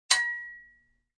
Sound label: Single clank of metal on metal.; Clanks; Objects